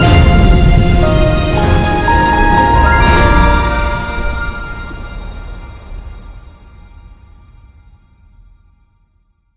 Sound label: large Win